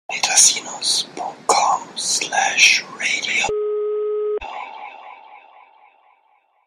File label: Jingle, radio; MetaSinus com